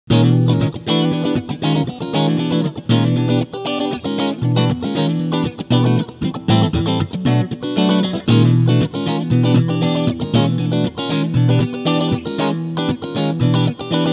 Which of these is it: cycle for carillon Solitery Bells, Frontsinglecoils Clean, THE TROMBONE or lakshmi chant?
Frontsinglecoils Clean